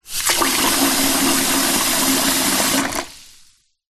household glass bowl fill water from kitchen faucet tap sink; zapsplat